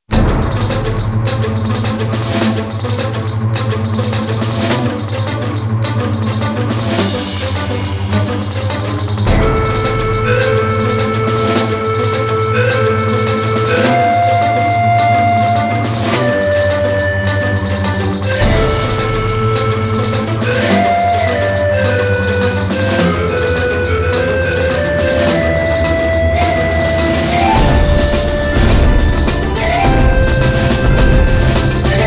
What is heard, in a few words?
Walking; genso sangokushi original sound track 2004